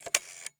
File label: Telephone Rotary Dial; Communications; 1001 Sound Effects